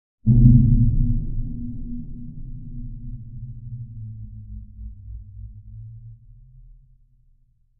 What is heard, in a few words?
Underwater Thump with Reverb, Phase Tail